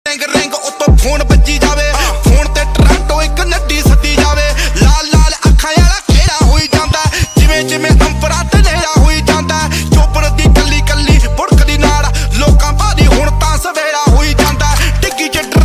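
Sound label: ring ring phone, punjabi song ringtone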